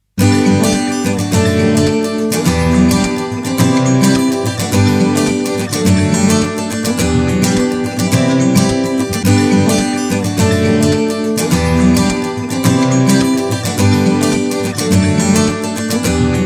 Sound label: ACOUSTIC GUITAR RUN